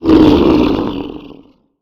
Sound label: golem; monster; boss